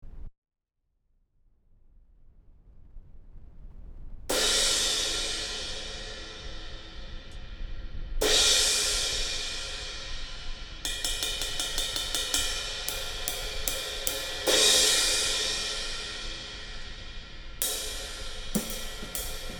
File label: 20 Paiste; Proto